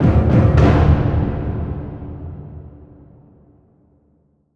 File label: combat end win sting; Armello The Bandit Clan